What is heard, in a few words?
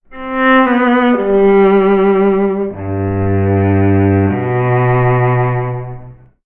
strings cello, sad; Loops